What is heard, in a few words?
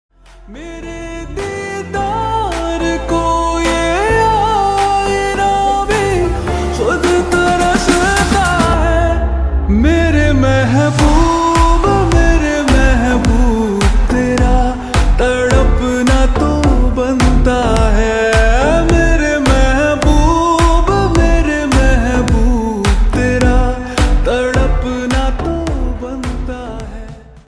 Slowed Reverb Ringtone